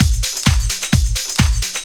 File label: drum 130 tribal, drumloops